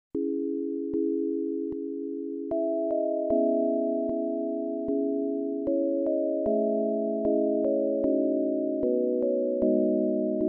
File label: vocalise bell